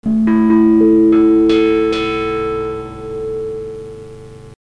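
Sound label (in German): klangschale, waldorf synth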